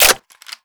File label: m4 reload clip in; foley; Call of Duty Modern Warfare